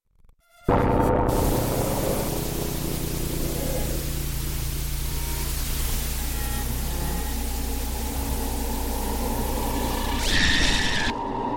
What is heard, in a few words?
White Noise Audio